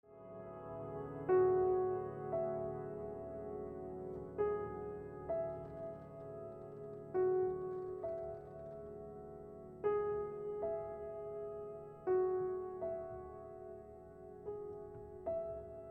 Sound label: Improvisation